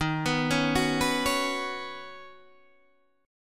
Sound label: Eb Minor 7th Sharp 5th, Guitar DADGAD